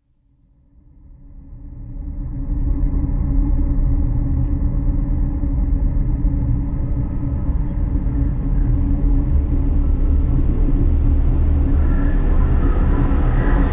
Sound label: Horror Ambient